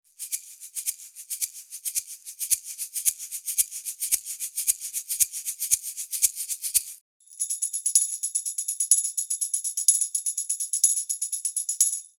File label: TGX58, vocal mics, perc